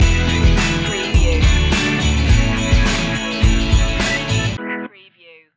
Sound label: stinger, brash